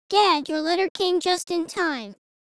Soprano

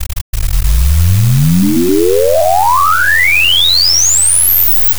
noisy Wave Semi

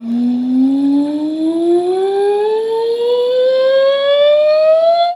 glissando

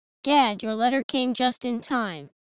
Mezzo-soprano

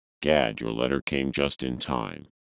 Bass

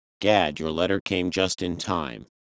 Bariton

